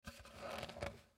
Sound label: Serving Tray Handle, Foley Serving Tray Handle Movement Creak; Miscellaneous Bar; Blastwave